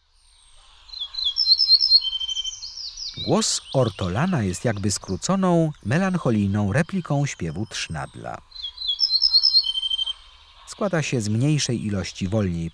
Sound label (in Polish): Ortolan, Ptaki; Kurs PTTK